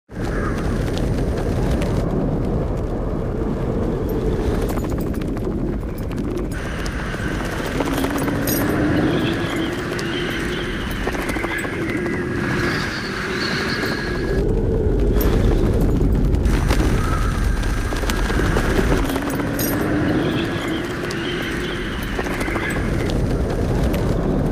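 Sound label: Oncoming air flow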